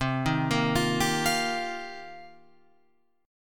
C-Minor 11th-C-12,10,10,12,10,12, down, Guitar, Open F, Cm11 chord; arpeggiated; JGuitar